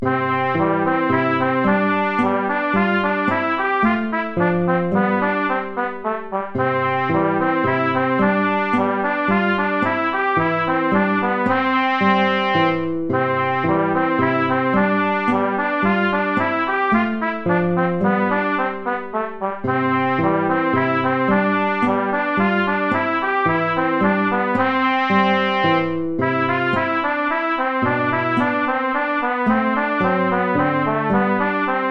tempo minuetto; hook james

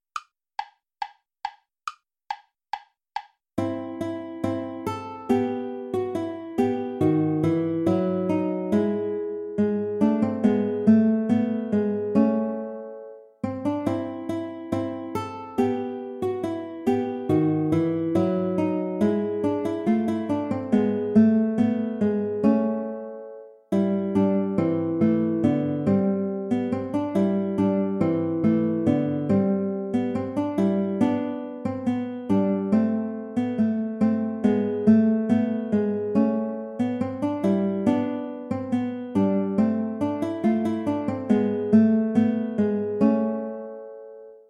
allemande play along without guitar

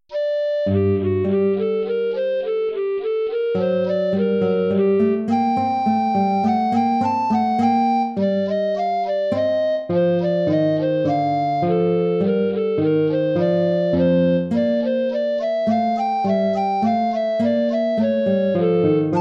courante

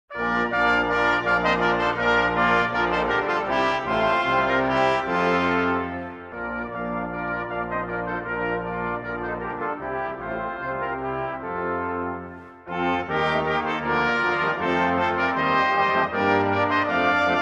intrada, organ; Nona Intrada; Lustgarten neuer teutscher Gesang 1601

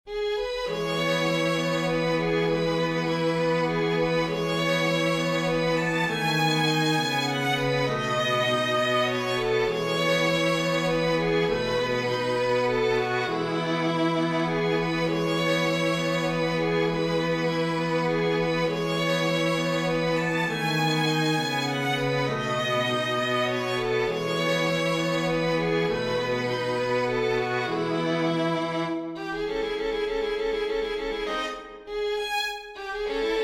for string quartet; kullak theodor; schifflein auf dem see; from kinderleben

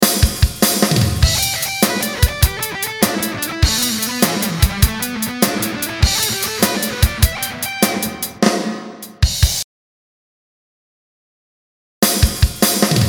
pentatonic minor patterns; rean koresh

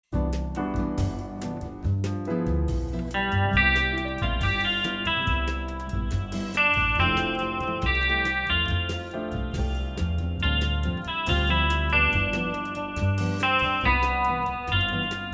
bossa

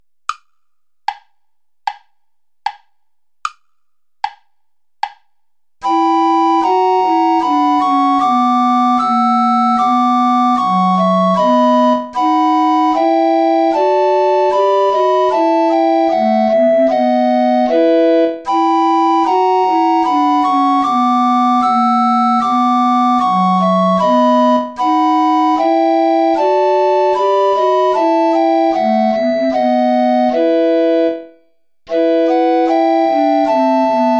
play along without tenor recorder

